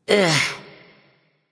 ugh; smite; mercury

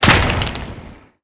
large impact; smite; thanatos

